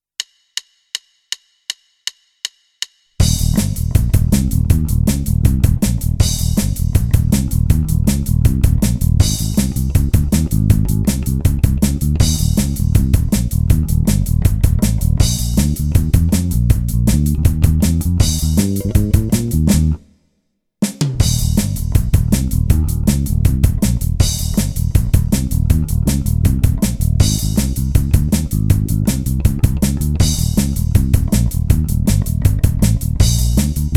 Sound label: blues 20w; hitoribiki